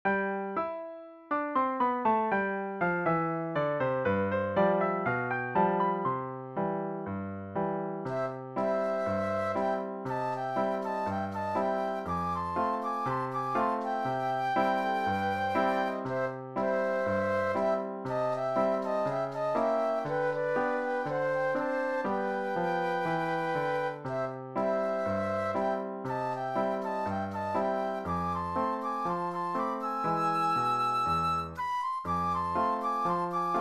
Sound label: Flute Duet and Piano; classical